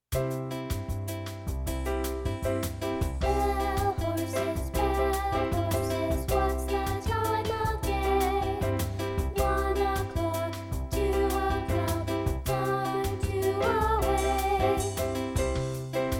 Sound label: Bell Horses